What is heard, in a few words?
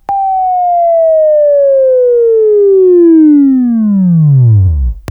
WAV Right, Sweeping; Labview-Program; Sweeping Training program